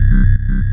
Scifi Synth Drone Loop; SFX Kit; Sci-Fi Loops

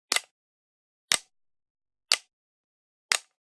Generic Button Plastic Small

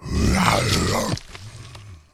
zombie attack

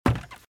Footsteps - Wood - Creaky - Run - Left

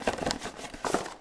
Cardboard Popcorn Box Open; SFX Kit; Foley - Misc

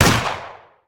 shotgun gunshot; abe; Blind Drive

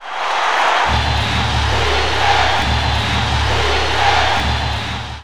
Football Crowd Defense; Crowds

